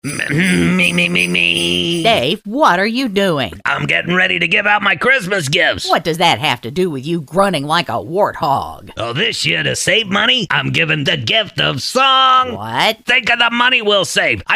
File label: Singing Christmas; Discount Daves